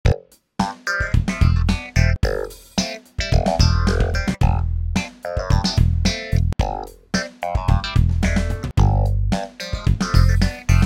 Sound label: First Groove; iCocos